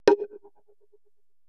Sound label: PERC MIX, Retro Sampler; Best Service ProSamples vol.55; AKAI